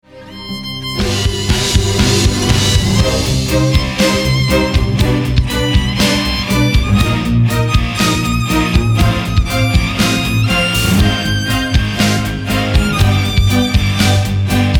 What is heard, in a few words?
Orch, Rock